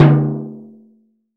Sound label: mid tom one shot a key, tuned to the A note. Loudest frequency: 303Hz; tom drum